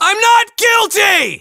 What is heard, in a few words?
puzzle lose; phoenix